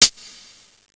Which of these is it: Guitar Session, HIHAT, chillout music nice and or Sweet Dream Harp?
HIHAT